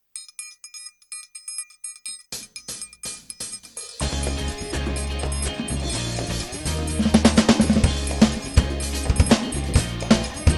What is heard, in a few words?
Drumit loopkit